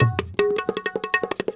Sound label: INDIA L, IND ENS PH, Heart of Asia; Spectrasonics - Heart of Asia CD2